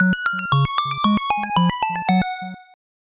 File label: 8bit jingle normal